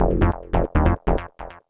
SNTH BASS ELCTR 140 A, Techno

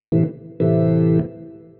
DEEPHOUSE ORGAN 125 A

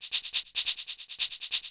TECHNO PERCUSSION 140

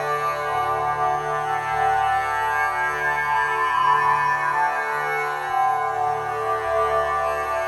ethno, 125, A

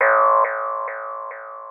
TECHNO EXTRA 140

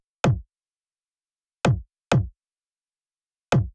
drum loop 128 Perc; Electro; drum loops; Anthemic

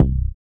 BASS MONO

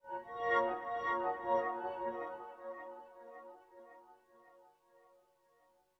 SYNTH PAD ETHNO 160 C, Sphere

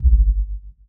Kick; BlissedOutBeats; Touch Loops; Drums

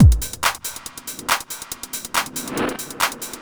TECHNO BEATS 140

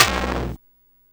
DEAD BD; ELECTRIC-SET

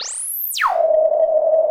SPACE FX 140; SpaceSounds

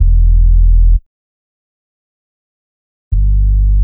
DEEPHOUSE BASS 125 A

